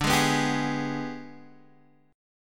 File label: G sharp Minor Major 7th Flat 5th